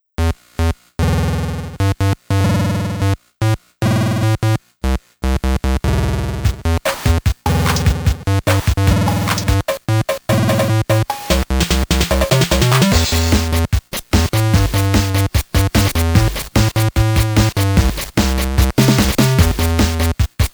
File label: waves waves